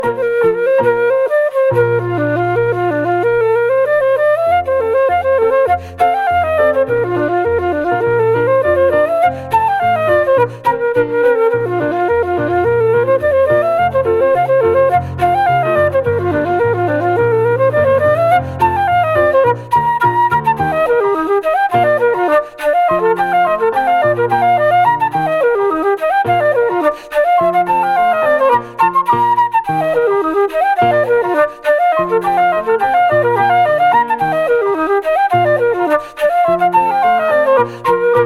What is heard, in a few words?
Hornpipe